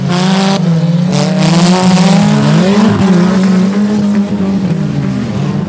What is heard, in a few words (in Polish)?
Odgłos silników; Wrak Race